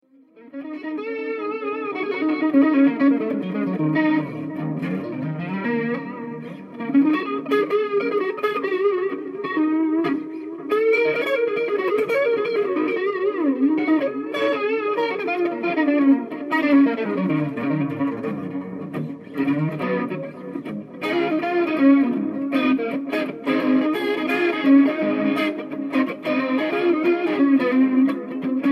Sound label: Speedster blues, VB-3, modulation